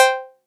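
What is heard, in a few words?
Cowbell a#2, drum machines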